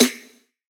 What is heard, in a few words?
normal hit clap, Taiko; osu